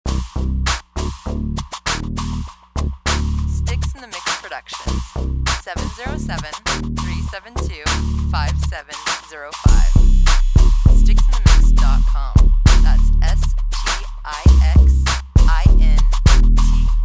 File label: crunk